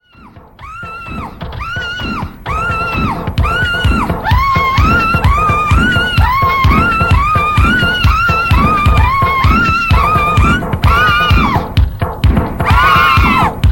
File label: Drum Beat